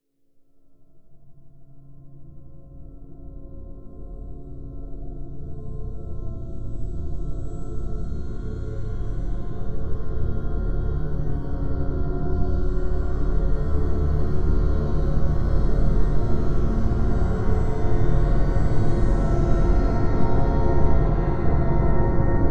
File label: Bass Soundscapes; OB6; Omnibenevolence 3